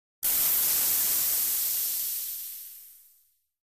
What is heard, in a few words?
Biking High Hissing